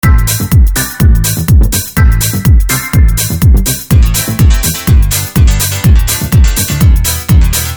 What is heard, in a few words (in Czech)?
stopy drums hore; ukß╛ka prφli╣ silnej rytmiky bicφch